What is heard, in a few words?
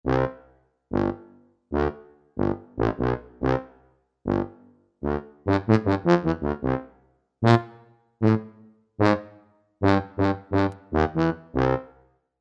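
Classical Tuba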